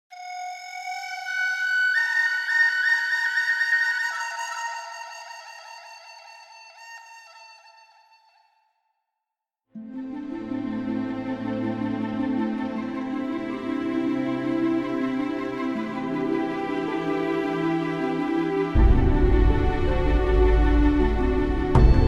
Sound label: Dizi